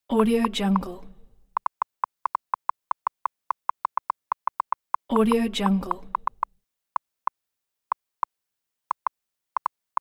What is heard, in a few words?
typing text on a phone